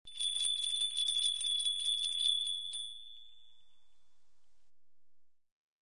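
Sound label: little bell 1-3 seconds; Колокольчик; Колокольчик №1 -3 сек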